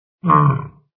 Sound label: sharkman wound